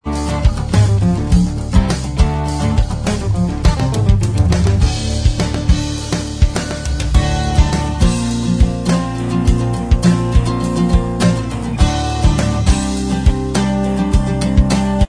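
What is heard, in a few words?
acoustic, Instrumental Track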